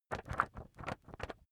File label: magazine wobble soft